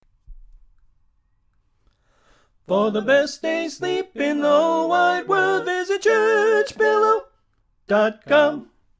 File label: Lead Left; Pennsylvania Groups